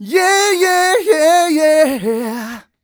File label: 128 uh e-flat, mal, Vocals